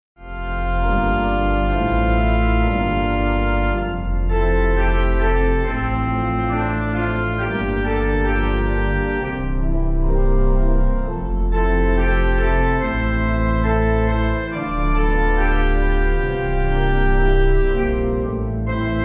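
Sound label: Pipe Organ, Music Backing Files for Churches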